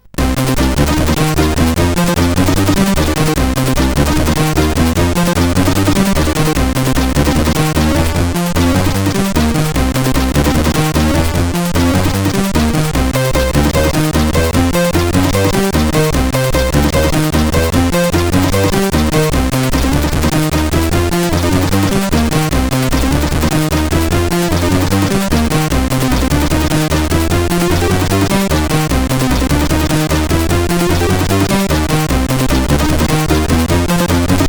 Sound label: intro, CSG8580R5